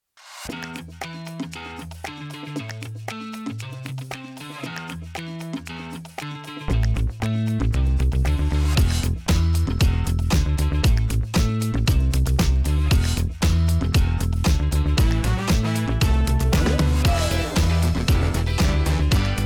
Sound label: retro funk energetic background music